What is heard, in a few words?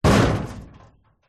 impacts metal hollow cabinet old knock hard rattle